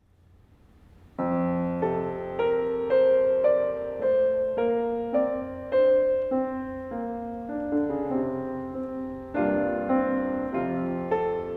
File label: Romance, Rag Caprice; Trios